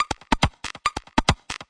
140 crispy crunch techno highs, drum loops and breaks